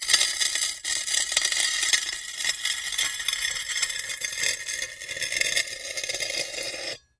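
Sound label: sounds of scratches on metal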